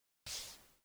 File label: wall